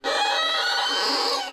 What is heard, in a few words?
Violin Screech Noise Mid; soundscrate